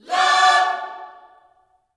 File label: LOVE CHORD, Gospel, Vocal; ILIO; Vocal Planet